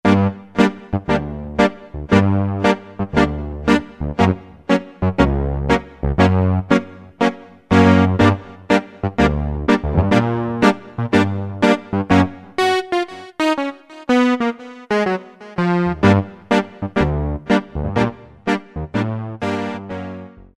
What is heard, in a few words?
Clavinet, Cheetah MS6